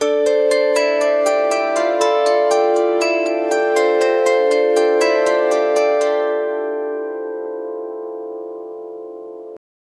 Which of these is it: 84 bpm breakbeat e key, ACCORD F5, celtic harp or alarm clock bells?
celtic harp